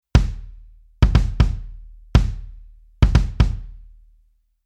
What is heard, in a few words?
Rhythm Basic Velo Kick